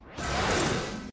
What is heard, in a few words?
Teleport Tone; Ben 10